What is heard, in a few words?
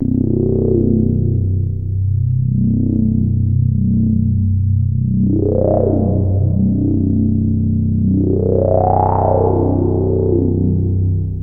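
sample and hold slow resonance, synth; Keith Emerson Signature Series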